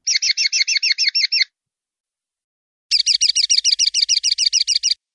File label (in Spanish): sonaja tiotio, pardillo